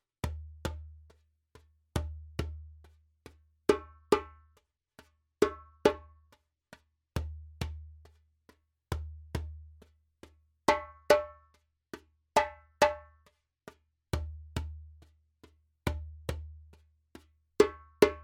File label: djembe; djembebog oevelse